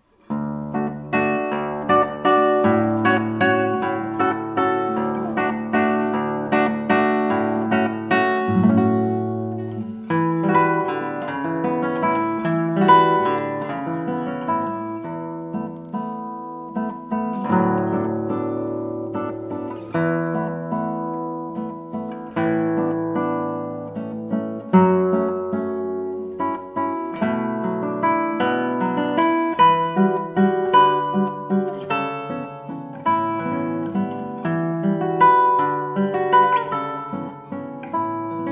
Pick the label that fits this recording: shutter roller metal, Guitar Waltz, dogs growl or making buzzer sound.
Guitar Waltz